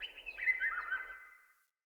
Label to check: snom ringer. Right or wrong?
Wrong